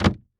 Foley Footstep Hard Sole Dress Shoe Jump On Wood Platform Quick; Foley Footsteps